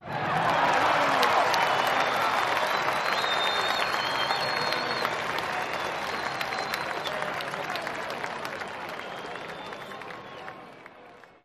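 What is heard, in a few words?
Applause Cheering Whistle, large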